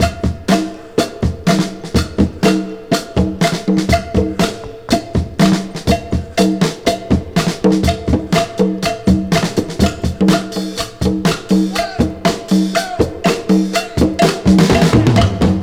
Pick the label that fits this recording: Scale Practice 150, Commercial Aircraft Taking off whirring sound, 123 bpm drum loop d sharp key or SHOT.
123 bpm drum loop d sharp key